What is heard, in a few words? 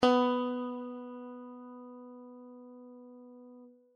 guitar b